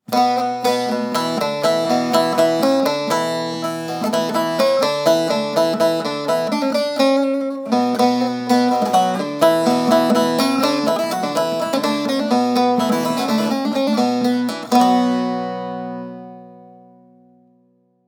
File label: Saz Baglama